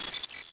PDA appear; interface

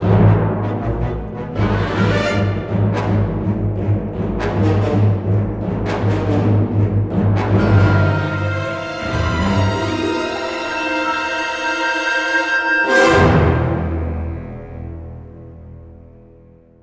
battle, music flourish

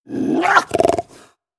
Cougar Vocal; Animals; XBOX; BassProShopsTrophyHunter2007

